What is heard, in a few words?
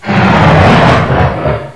die; svencoop; aomdc; face